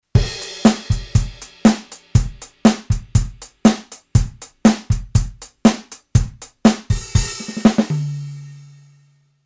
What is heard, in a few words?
Rhythm Kit Prog